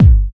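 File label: Oldschool, Kicks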